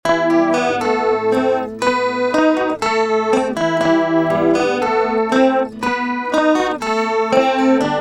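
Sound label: acoustic guitar polyflange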